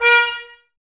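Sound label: Brass trumpets A#, Mute; Miroslav Vitous - Woodwind Brass Ensemble; Tps Mute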